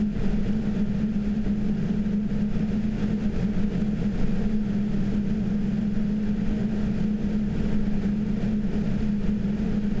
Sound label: Max RPM